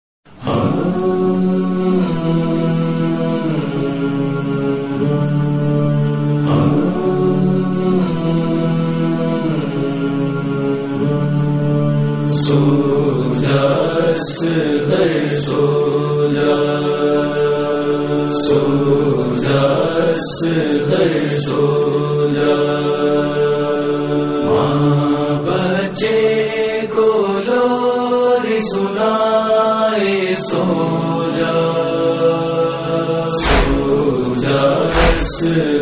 Noha; Hazrat Ali Asghar Noha 2022; Muharram 1444; NAWHA 1444

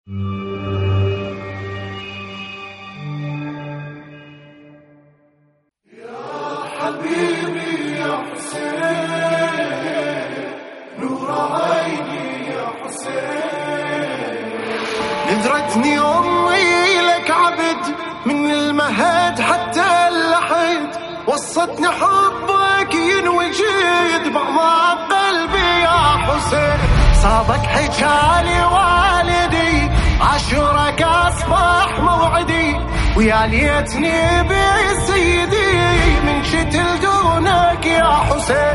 NAWHA ARABIC

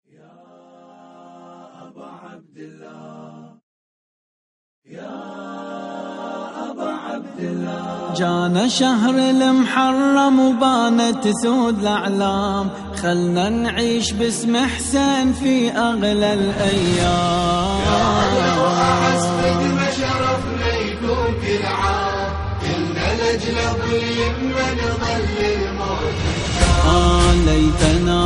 NAWHA ARABIC

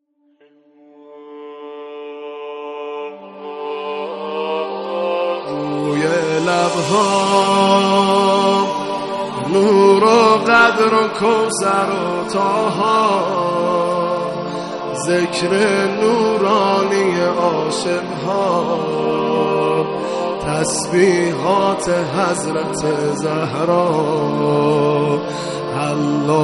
Noha Urdu Farsi; Tasbihate Hazrat e Zehra sa

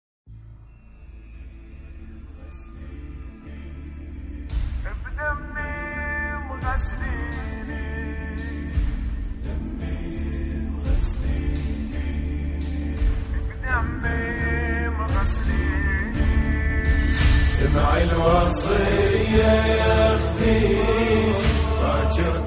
nawha arabic; NAWHA 1444

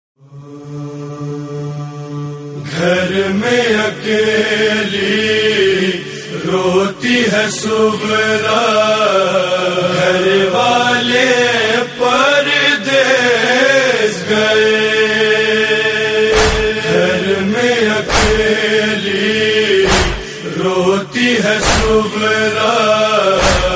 Nohay, Noha; Bibi Sughra

